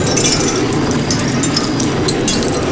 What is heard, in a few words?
grind glass monster; terrains; Tony Hawk's Project 8; XBOX